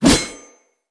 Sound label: Sword Swipe, Whooshes; Weapons; Melee